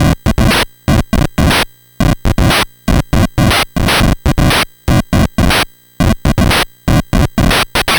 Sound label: NES Noise Drum Loop; Nintendo Entertainment System; Drums; Chiptune; Sonic Foundry (Sony Creative Software)